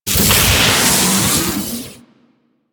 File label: Lightning Rod Strike; Warframe; Ambience; Corpus Gas City; Gameplay Remaster